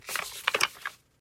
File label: paper open